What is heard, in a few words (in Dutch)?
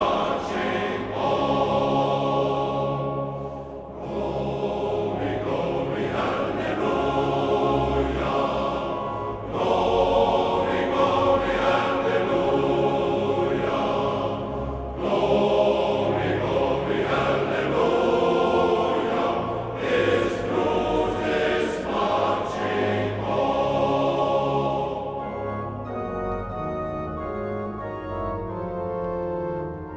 Koor mannen Glory hallelujah; Mastreechter Staar